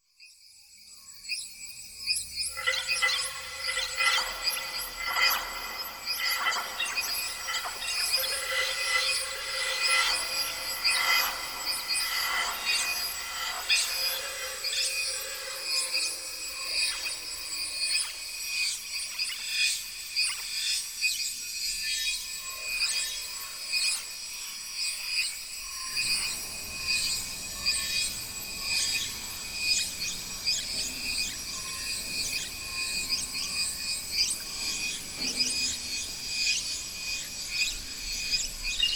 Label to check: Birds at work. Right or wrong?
Right